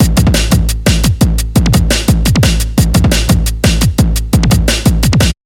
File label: Hardstep DnB